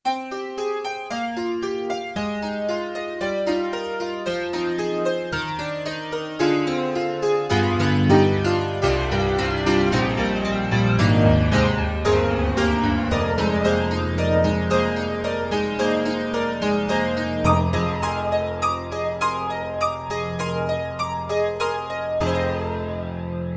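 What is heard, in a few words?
Alesis NanoPiano, Acoustic Piano, Brite Grand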